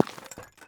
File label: ceramic dug; everness